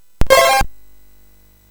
MOS6581R4, GAMES; Solitaire

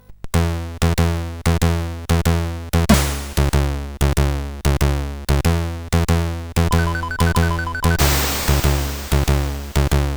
intro sid CSG8580R5; Balloon or Die

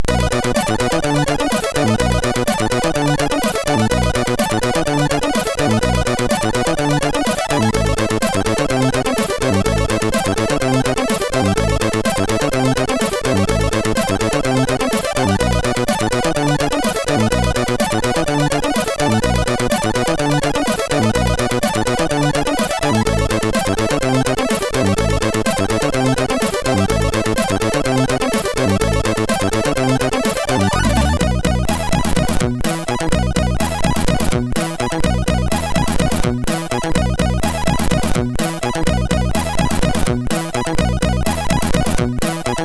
Bass, MOS6581R4

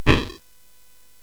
SFX; Narco Police; hvsc

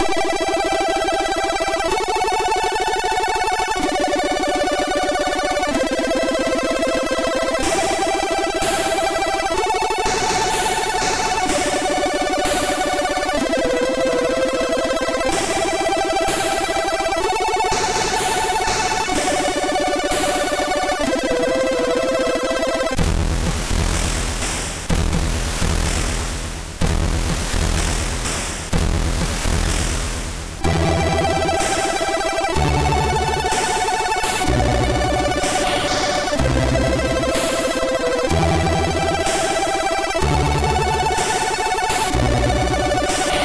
Techno, MOS6581R2

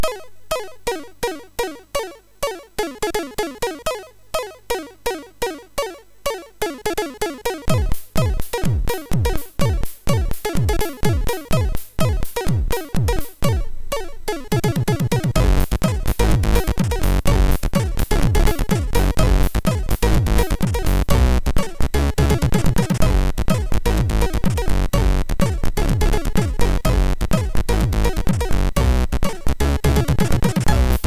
Funky Groove, MOS6581R3; hvsc

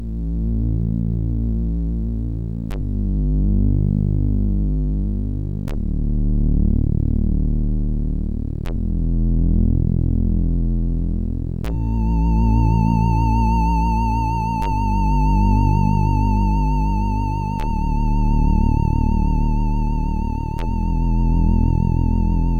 Bells, sid, MOS6581

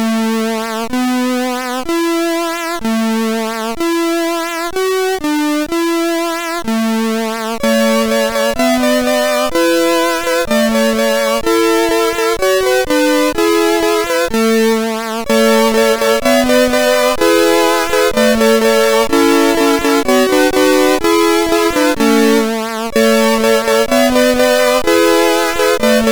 sid CSG8580; HVSC; All Silent

